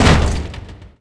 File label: door slam open; Tony Hawk's Project 8; XBOX